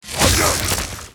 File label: Shaman Attack